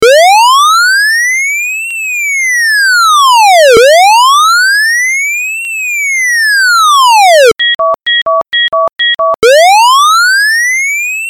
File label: siren